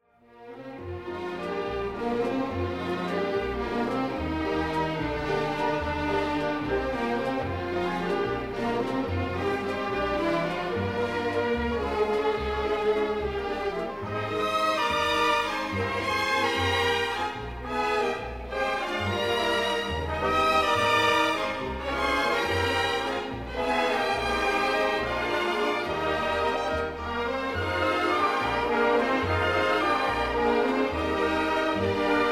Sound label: embassy waltz